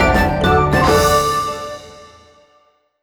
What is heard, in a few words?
big Win End; netent